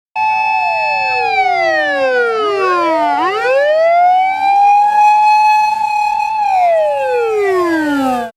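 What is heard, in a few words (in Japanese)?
syoubousya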